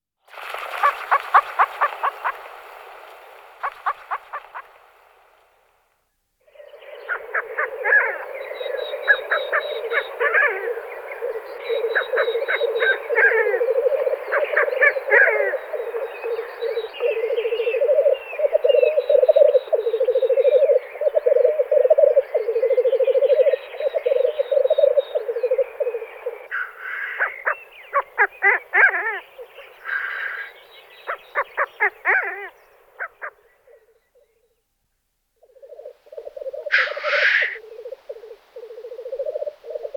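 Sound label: teterev golos, black grouse voice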